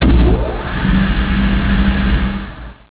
ion off

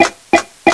key button

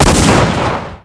357 shot, weapons

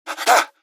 startle, gasmask

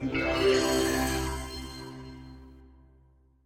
level complete

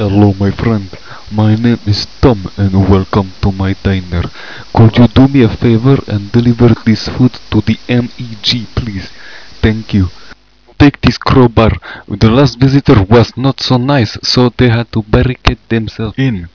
tom

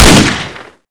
deagle

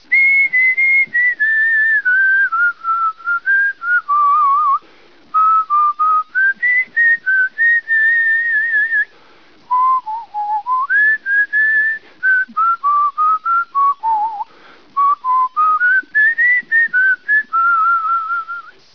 song grunt

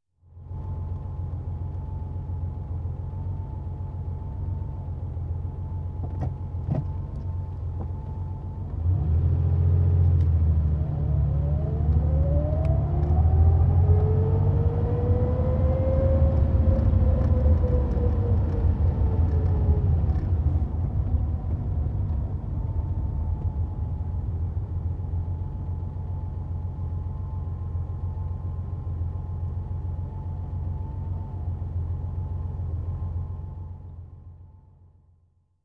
alien sound

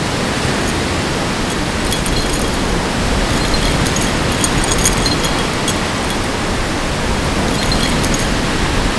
high wind; ambience

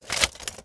rpg load, weapons

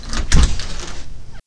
sqeaky open

